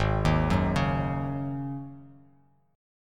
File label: G sharp 7th Suspended 4th G sharp; G#7sus4 arpeggiated; 4,6,4,6; JGuitar